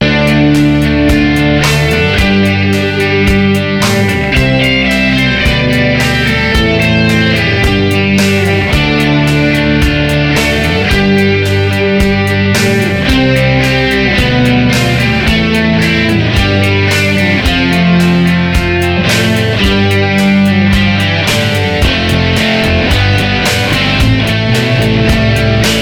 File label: Modes D B